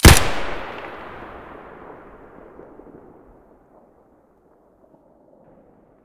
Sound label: sks muzzle stereo, weapons